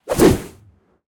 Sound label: Melee Swings Normal Swings; 96khz; Stereo; Weapon Swings; David Dumais Audio